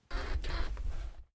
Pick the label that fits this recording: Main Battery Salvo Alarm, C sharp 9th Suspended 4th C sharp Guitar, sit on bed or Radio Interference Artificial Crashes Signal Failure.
sit on bed